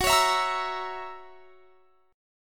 Gb 7th Gb down, chord; Listen to Gb7 strummed

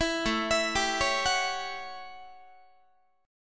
A 13th A down Guitar; A13 arpeggiated; 19,17,19,17,17,17

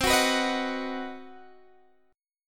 C Minor Major 7th Flat 5th C down Guitar Standard; strummed; JGuitar

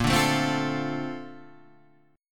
Bb Major 9th, Bb

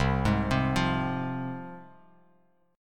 C Suspended 4th Sharp 5th; arpeggiated; 8,11,10,10; JGuitar

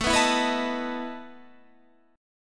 E Major 7th Suspended 4th, A

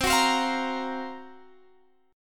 F sharp Major 7th Flat 5th C

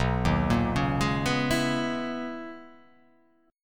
B Major 13th B down

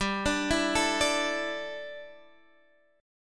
A 7th Suspended 4th G, chord

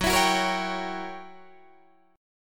Fsharp 7th Flat 9th down Guitar Open D

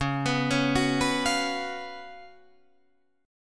Db 11th Db, Guitar, Drop D

